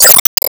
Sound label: Lightningspear Fall Hit; Disney Star Smash; Android, iOS, Mobile